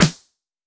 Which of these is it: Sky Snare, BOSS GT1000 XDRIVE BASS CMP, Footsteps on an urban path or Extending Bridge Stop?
Sky Snare